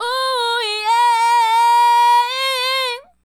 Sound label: Ooh Yeah, Hip-Hop and RnB Vocals